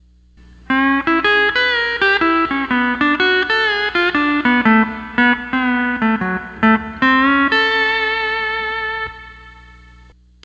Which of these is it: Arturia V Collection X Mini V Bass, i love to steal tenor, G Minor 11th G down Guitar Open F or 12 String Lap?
12 String Lap